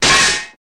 Metal Hit Fall, small impacts

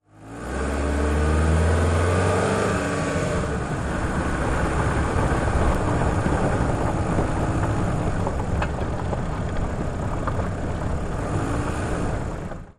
Sedan Pavmt Grvl Med

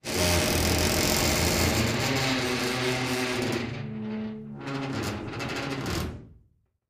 Vault Outer Door Open; safes & vaults

